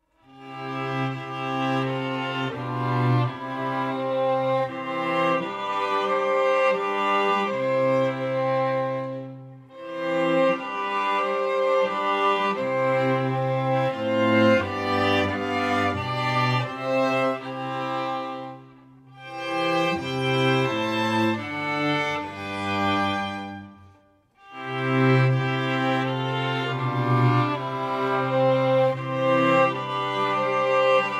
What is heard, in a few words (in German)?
Holzblaeserquartett Steirische Arie